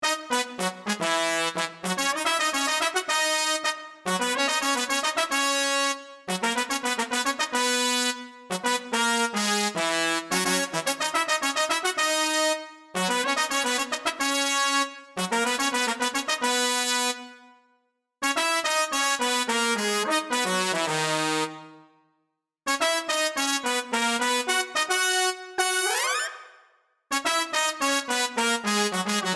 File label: MODX Brass